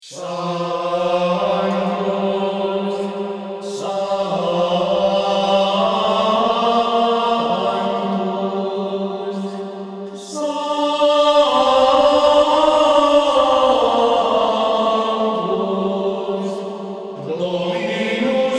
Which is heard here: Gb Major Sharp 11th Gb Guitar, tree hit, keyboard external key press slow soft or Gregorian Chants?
Gregorian Chants